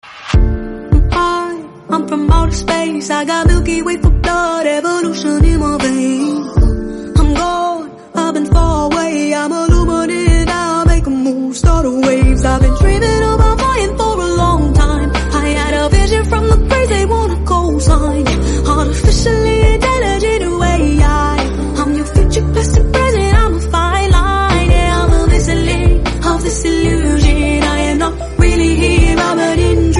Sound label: english song